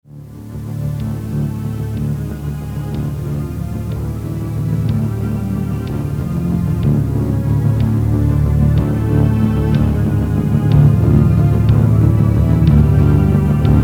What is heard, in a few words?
waltz